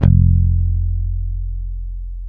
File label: SAWM F, bass; Spectrasonics; Bass Legends CD 1